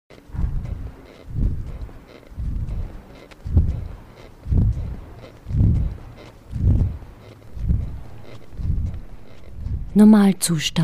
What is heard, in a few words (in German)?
jingle